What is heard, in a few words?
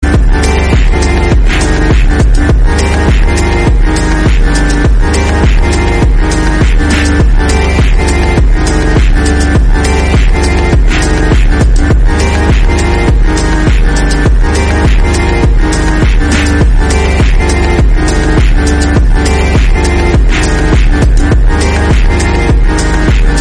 slowed